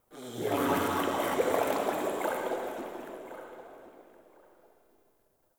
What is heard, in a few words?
Covered Vine Area Wet Gurgle; Ambience; Misc Outdoor; Warframe; Infested Eris